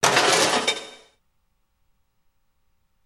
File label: Impacts Crash Metal